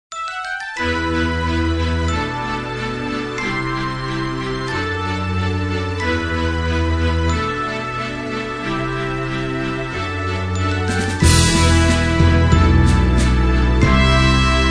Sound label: orch cue